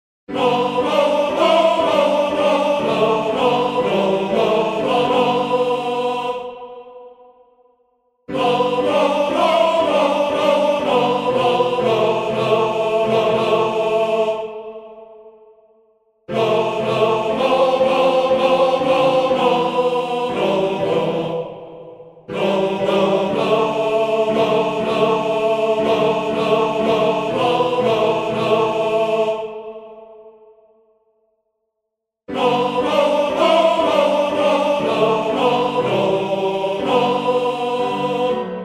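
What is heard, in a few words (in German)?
Tenor, Männerchor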